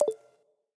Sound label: device removed; steam